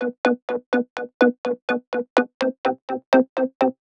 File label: 125 Syn, 125bpm; Nu Dance Electro; Zero-G; Soundclash; Combi Loops; Sticky Bits